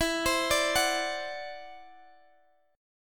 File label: E 7th Suspended 2nd Sharp 5th E down Guitar DADGAD